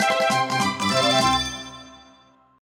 coins; Magikarp Jump

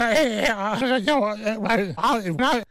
high pitch choking

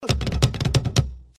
hula drums; lion king

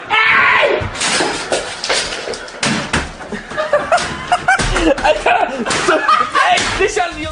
television break

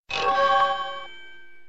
crystal

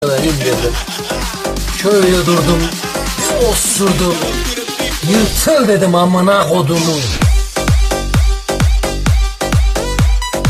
club mix

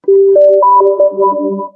oculus quest 2 boot up

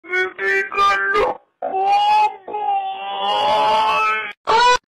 explode; countryballs

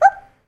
slime jump; slime rancher

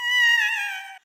winged pikmin death

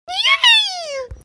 yay

